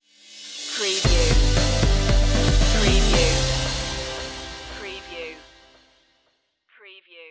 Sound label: future technology stinger